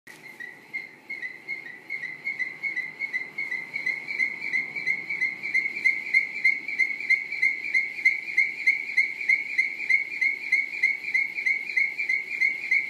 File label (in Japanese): プラスチックの蟲笛（うなり木）